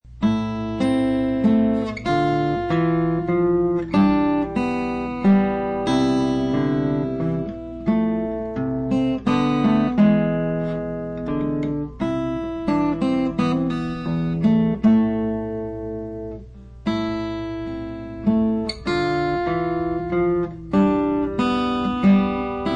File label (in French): Menuet; Krieger